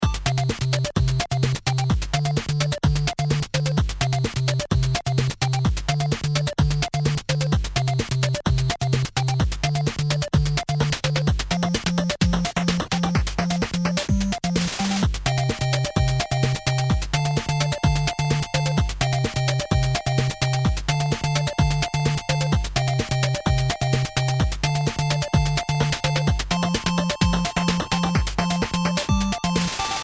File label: beat